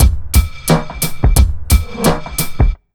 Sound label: BEAT, Underground Hip Hop; USB Soundscan vol.02; AKAI